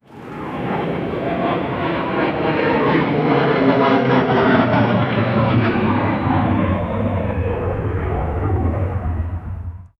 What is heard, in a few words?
A-10 warthog plane flying; aircraft